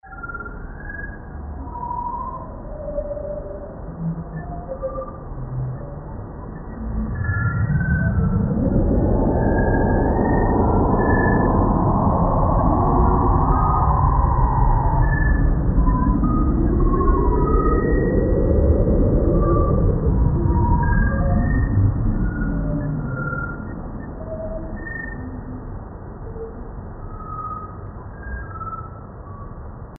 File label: m16 sonification